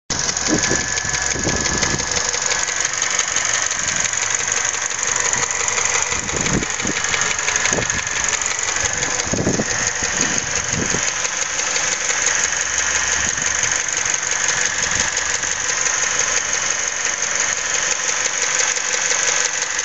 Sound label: engine sounds loud; mazda6